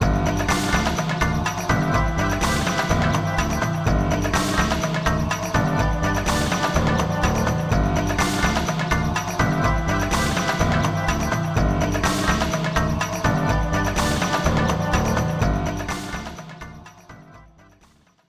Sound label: Bgm Enter Initials; Panic King